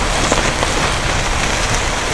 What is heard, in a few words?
GRASS